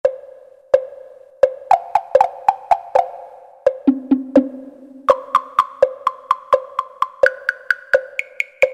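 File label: TEMPLE BLOCK, Latin And F.X. Percussions; Roland SN-U110-02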